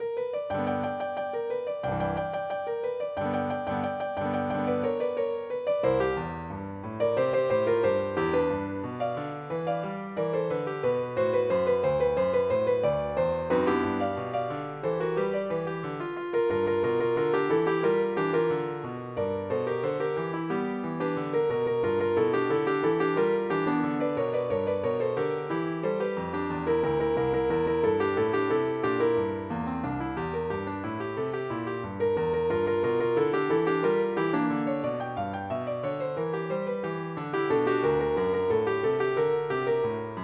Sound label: carol